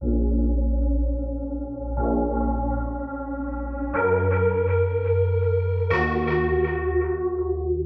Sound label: Main Melody (Filtered), 122bpm A#min; Construction Kit; Ghosthack; Summer Freebie; Acapella Kit